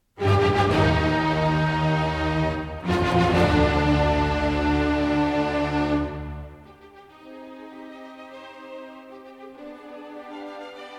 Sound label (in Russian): Музыкальный фрагмент